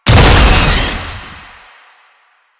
Technocyte Explosion; Enemies; Lasrian Tank; Warframe

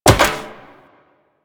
Wall Jump Metal Perforated; Footsteps; Warframe; Tenno; Wall Run

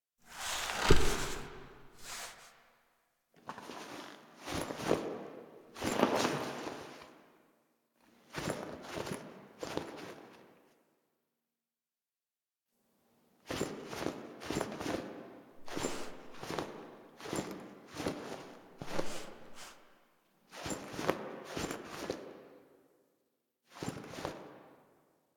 Foley; Zariman; Table For Two

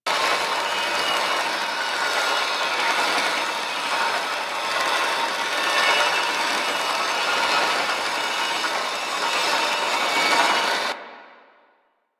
Rotating Orb Pedestal Loop; Ambience; Props; Warframe; Entrati

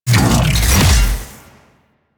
Mech Stab Ability Throw; Nechro

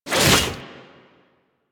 Inf Transform Claws Whoosh, Infested; Warframe; Weapons

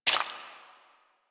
Pirate Footstep Default; Warframe; Warframes

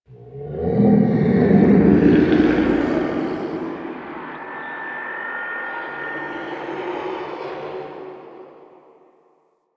Distant Infested Low; Warframe; Ambience; Nineteen Ninety Nine

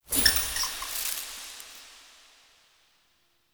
Rat Mote; UI; Animal Motes

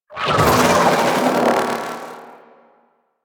Vocal Attack; Warframe; Sentients; Conculyst

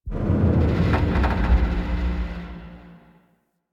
Corpus Ship Rumble; Ambience; Warframe; Corpus Ship Remaster

